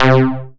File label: analog, bass